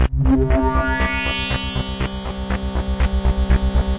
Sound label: Synthesizer Middle C Notes, Pad